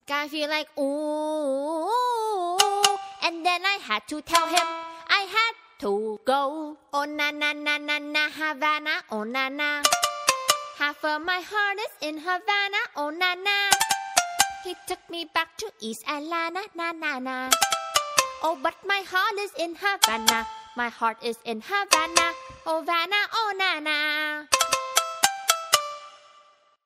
new vietnamese style ringtone

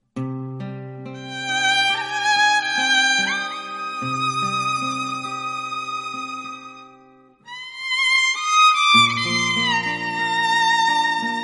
violin

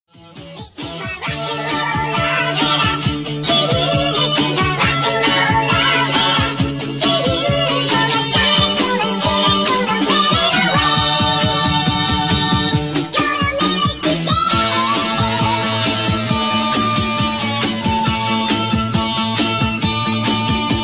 rock and roll ringtone

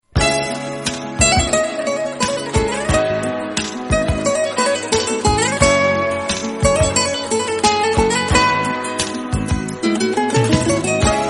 best of turkish

